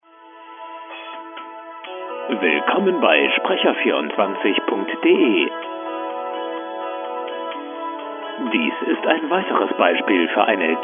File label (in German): musik